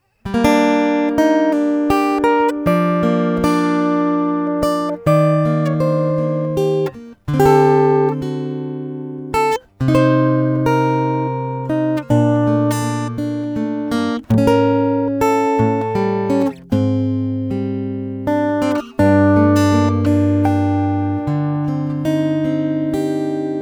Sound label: piezo di dry acg